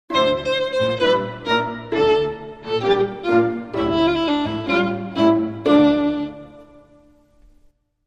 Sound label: Violin Sting